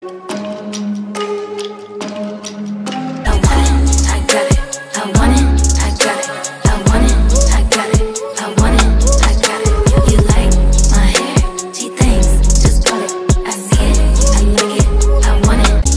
trap

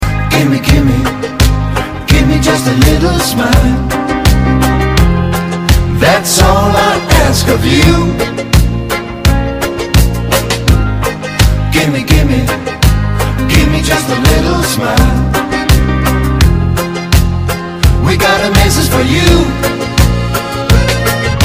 narezka 80kh

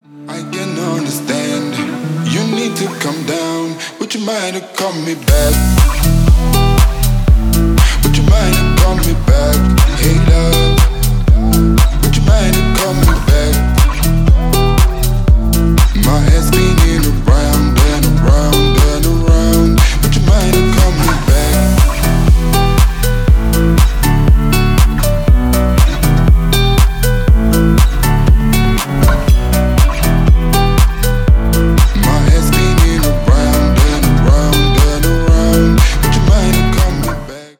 chill

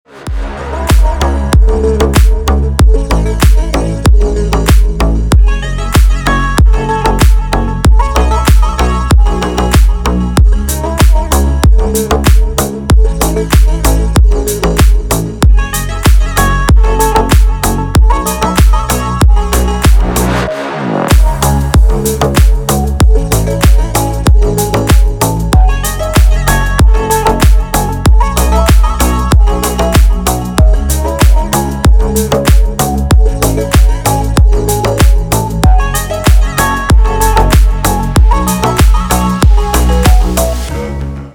call sound

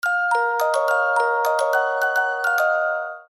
sms

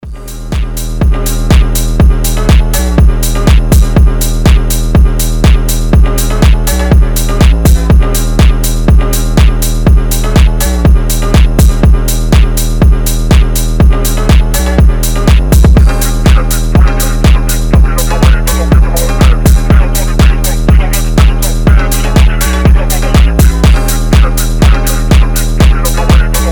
phonk house

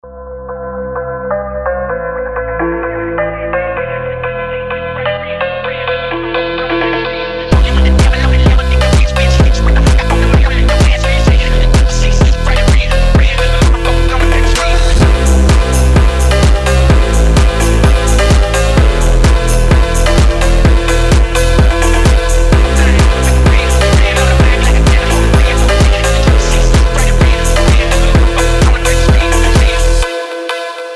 phonk house version

